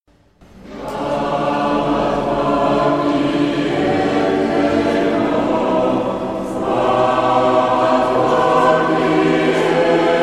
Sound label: Tutti; Anamnese St Jean